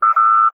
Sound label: computer beep